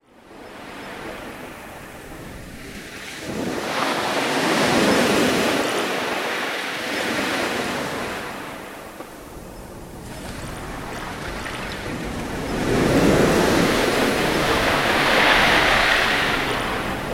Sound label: ocean sounds for sleep